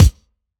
DnB Kick; drumnbass 170bpm; Drum Kits; musicradar; Drum Hits A

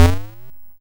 YM Bass E; ChipShop Deluxe; ChipShop Hits

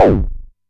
Amdek Perc

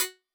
Tech Drum Hit, techno 120bpm

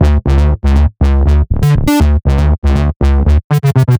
Tech Bass 120 D, techno; musicradar; 120bpm; Bassline

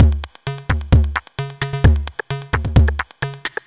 Beat (130BPM), techno; techno drum fx

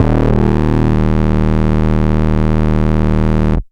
Bass B dist D#1, drumnbass 170bpm; musicradar; Bass B Multis

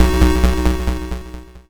YM Chord B; ChipShop Deluxe; ChipShop Hits

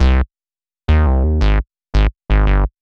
drumnbass 170bpm Bass C; musicradar; Basslines A

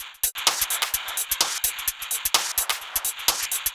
Beat 128BPM (Full No Kick), techno; techno drum fx

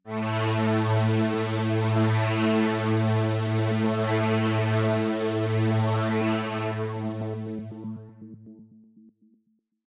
Tech Synth A2, techno; musicradar; 120bpm; Synth P Multi

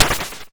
Snare, YM, CHIPSHOP HITS DRUMS; ChipShop Deluxe

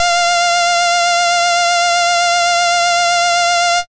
SID lead F; ChipShop Deluxe; ChipShop Hits

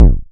Vermona Kick

